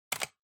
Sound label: PC Mouse